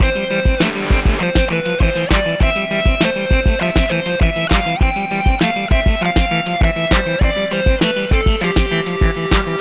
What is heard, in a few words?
hymn loop